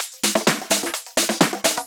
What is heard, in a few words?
Houseloops 128BPM; Vengeance Samples